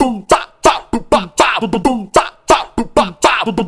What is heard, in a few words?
beat